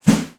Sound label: Arm Swing, Whoosh